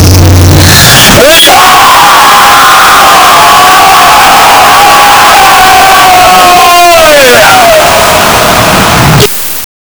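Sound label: create a high energy cheering, stadium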